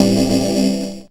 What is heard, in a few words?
dance chord